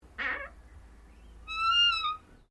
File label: Door Pivot Interior Wardrobe Louverd Squeak; Various doors; Miscellaneous Doors